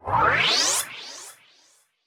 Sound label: TRAP TRANSITIONZ